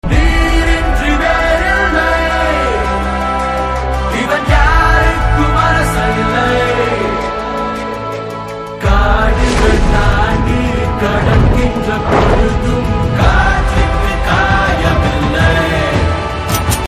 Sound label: Tamil Trailer BG Tone